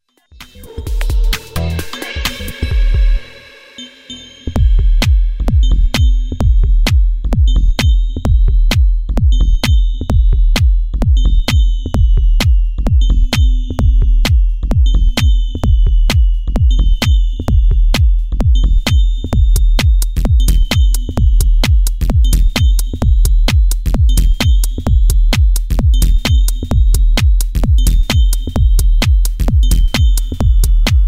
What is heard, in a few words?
raumton pitch and ring